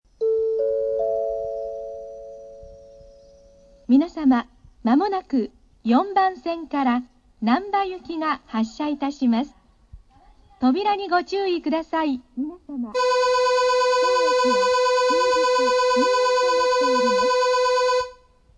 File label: kitanoda 4 bell, nankai